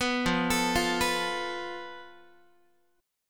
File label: F Major Flat 5th Guitar, chord; arpeggiated